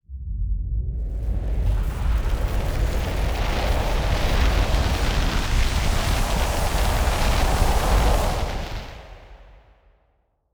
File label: silk cradle open start on rumble; Hollow Knight: Silksong